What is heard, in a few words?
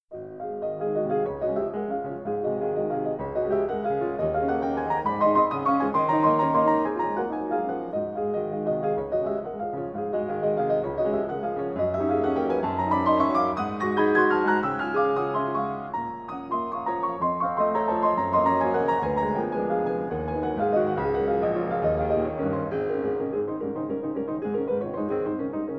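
Etude in D-flat major, piano; Chopin; Op.25 No.8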